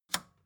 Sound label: record player power push on